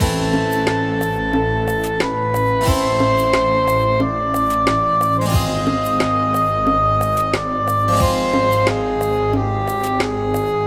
Elevator; Toontown: Corporate Clash Soundtrack; Bossbot Building

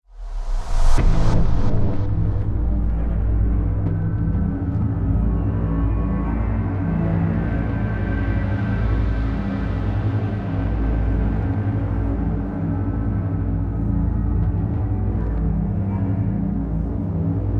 Ready to Battle; Ange Floette

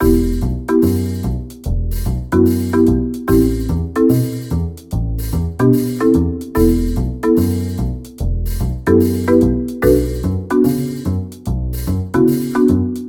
Elevator; Toontown: Corporate Clash Soundtrack; Cashbot

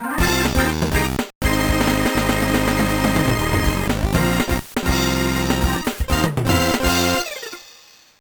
Unknown Jingle; Sonic Advance 2

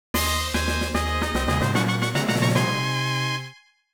Fanfare - Evolution; Pokémon FireRed and LeafGreen Remastered

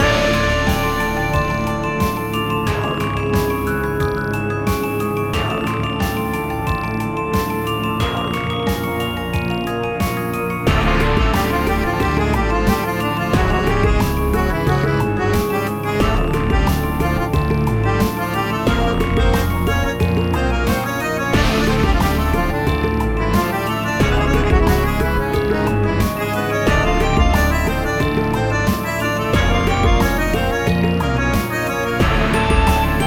heavy rain; Toontown: Corporate Clash Soundtrack; Rainmaker Battle

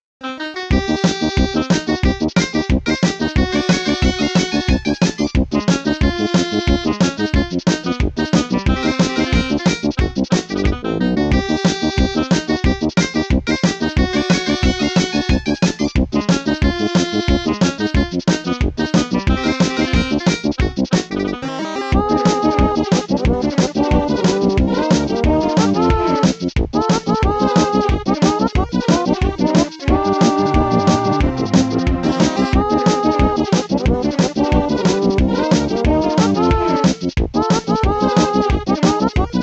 Tuba Solo; DELTARUNE; Lancer's

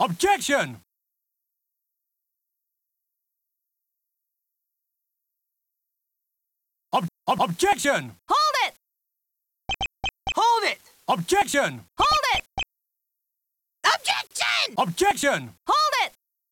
Acapella; OpenMPT

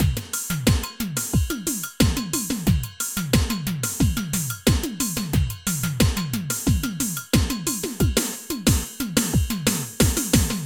elevator; Toontown: Corporate Clash Soundtrack; Pacesetter

